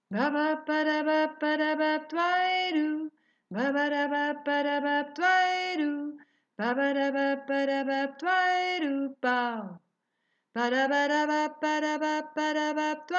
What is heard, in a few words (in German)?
Hintergrund Alt